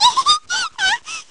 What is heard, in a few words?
baby laugh; baby peach